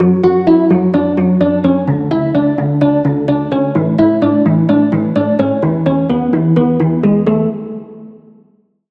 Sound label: Pluck; Final Peace; Routed Sound; Serum 2 Expansion - Flame Summoner Vol.1